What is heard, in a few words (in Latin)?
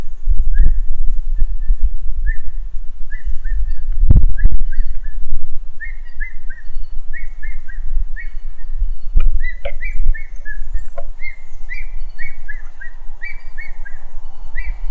Ornatus